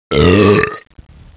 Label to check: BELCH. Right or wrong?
Right